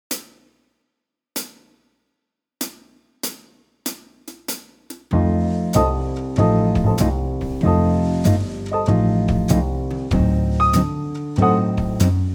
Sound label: easy swing 96